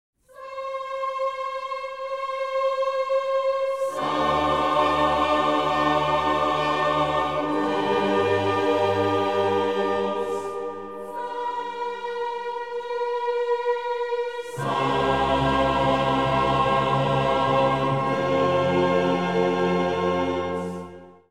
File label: Sanctus Sopranos Chorus